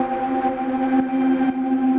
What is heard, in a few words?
Ominous Pads; Dust Particles